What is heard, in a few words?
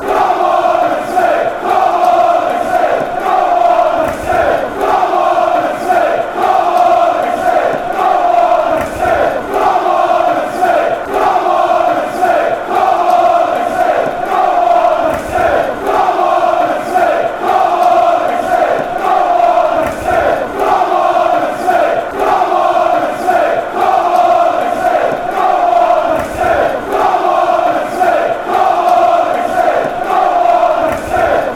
come, chants